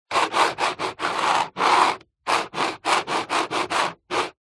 technology computer mouse movement desk

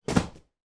leisure soft play padded object throw to floor

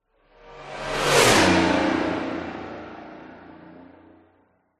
vehicles sports car race drive past fast designed

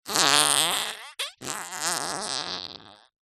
cartoon fart

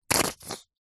food table sauce bottle squirt

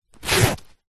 bag rucksack fabric empty zipper; zapsplat

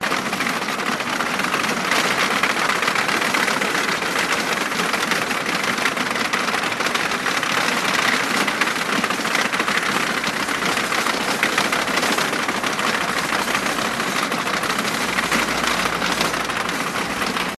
rolling cargo trolley loop; the sound pack tree

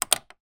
office pen biro plastic down on table

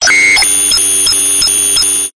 science fiction robot glitch electricity burst; zapsplat

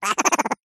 cartoon laugh high pitched

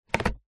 food drink plastic milk bottle lid screw on

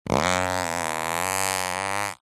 human fart long juicy

